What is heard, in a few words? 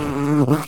Bumblebee, Close, Angry Like Fly Near Mic; Alexey Sinitsyn; Attack of the Bumblebee